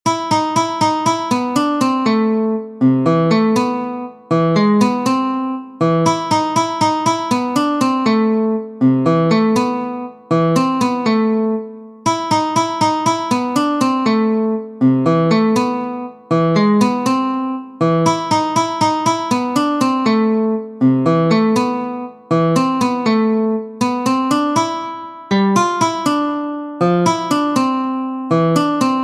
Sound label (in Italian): chitarra; per elisa